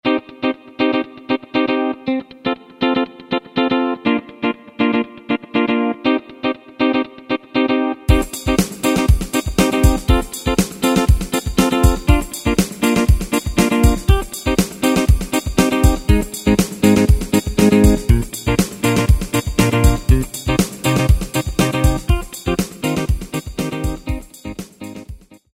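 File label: Guitar Chord, Yamaha YPT-400; Voices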